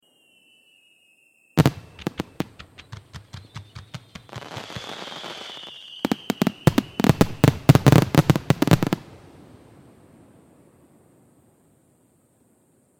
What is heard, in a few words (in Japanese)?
花火の音 連射; firework